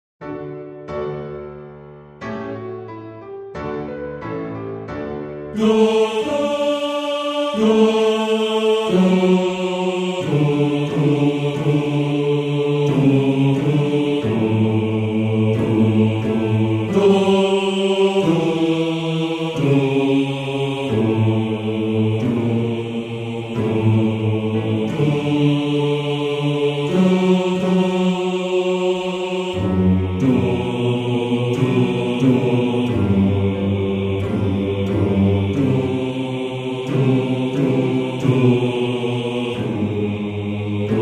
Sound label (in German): Bass 2; Die Ehre Gottes